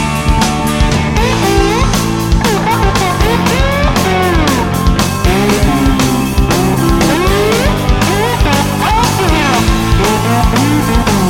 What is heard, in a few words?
guitar solo